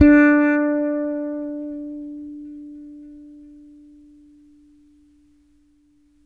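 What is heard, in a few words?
6-string fretless bass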